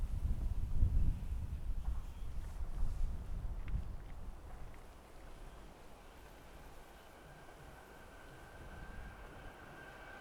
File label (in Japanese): FRONT NORM, 上り電車通過。; H2essential MS内蔵マイク指向性120°＋; ZOOM ヘアリーウィンドスクリーン WSH-2e; 録音したファイルを、本体でノーマライズしました。; ZOOM F6 MultiTrack Field Recorder